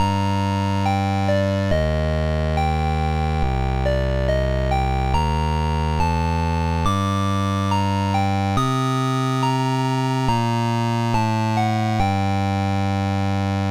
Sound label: ice palace